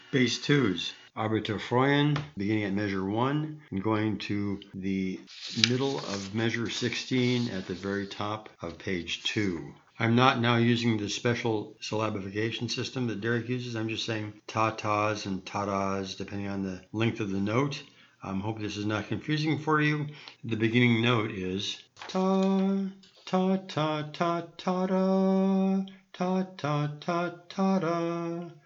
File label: Bass 1; measures 1-16; Bass 2; Yiddish Community Chorus of the Boston; A Besere Velt